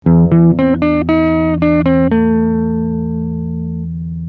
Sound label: clean; fetpa